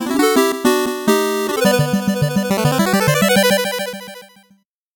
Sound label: 8bit jingle slow